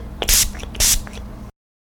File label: Spray